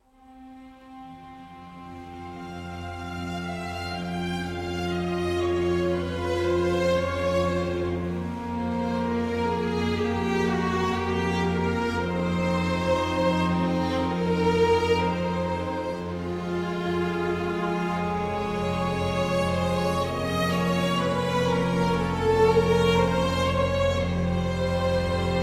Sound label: Elegy - Strings